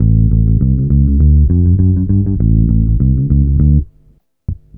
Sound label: BASS, BASS GTR